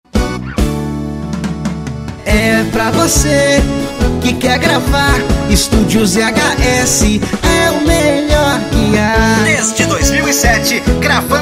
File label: jingle